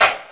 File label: SD, Drum & Bass, 140 BPM; Ueberschall; Drum & Bass Flash Trackz